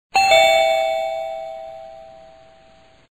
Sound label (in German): Hausklingel; Haushalt